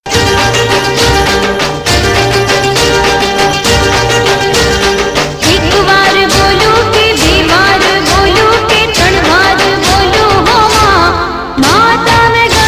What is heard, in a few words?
navratri garba mix, gujarati